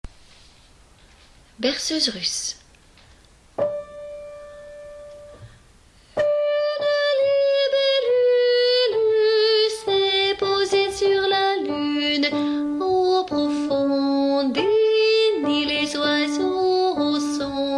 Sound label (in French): berceuse russe, basses